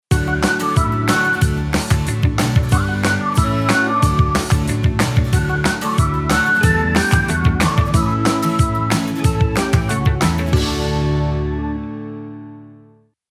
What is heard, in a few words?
Jingle